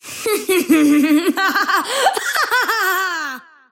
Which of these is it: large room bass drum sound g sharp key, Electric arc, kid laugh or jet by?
kid laugh